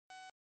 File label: hover